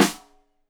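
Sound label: Snare Stereo, Drums; Deeply Sampled Drums; SMDrum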